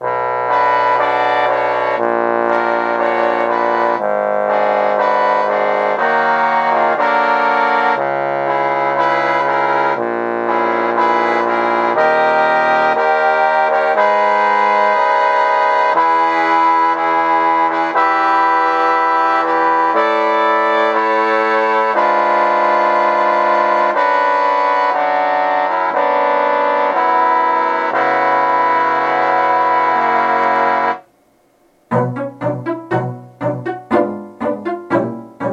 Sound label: Sinister and Creepy